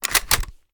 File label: kilo98 reload scope end bolt close; gmod; viper